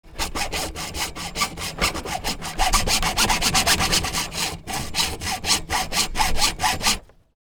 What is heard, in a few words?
Sawing plastic pipe handsaw squeaky; gfxsounds